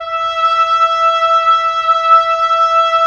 Oboe f; Roland - LA Composer vol. 4

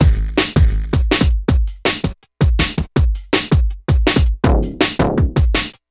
Full Beat, Drums & Percussion, Electronic; Sonic Foundry (Sony Creative Software) - Electronic Point Blank

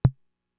Tom, Fricke MFB-522; TOMS

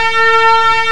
TRUMP; Roland - LA Composer vol. 1

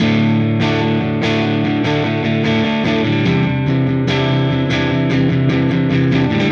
Silos Dirty Strum Guitar, Indie Rock

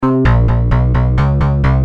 Bass, Industrial

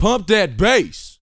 VOX F, Vocal Planet R&B & Dance HIP-HOP; ILIO

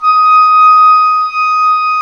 WND OBOE, Oboe Room; Roland L-CDX-03 Disk 1; Oboe 4-8

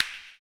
CLP, CLAP; ILIO - Ethno Techno

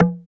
TEK PERC; ETHTEK CGA1; TEK PERC1; Drums In Your FACE!

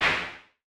CLAP, Vermona Mono15 Drums

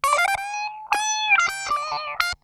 SQUEEZER, Funk Guitar; 90 s Sample CDs; Zero G

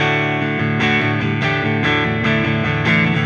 Silos Clean Strum Guitar, Indie Rock; Sonic Foundry (Sony Creative Software); Indie Rock Anthems; Session 11 - Silos

